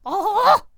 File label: Attack Vocals; Halfling